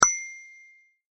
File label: ding